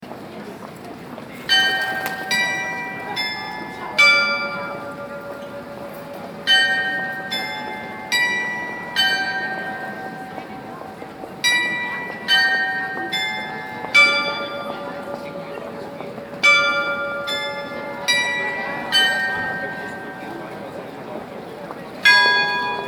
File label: glockenspiel